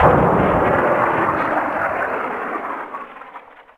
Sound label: distant shot; WAR